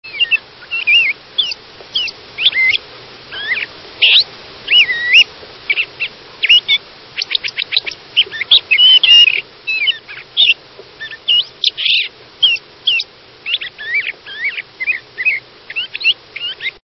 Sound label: Le Contes Thrasher, birds songs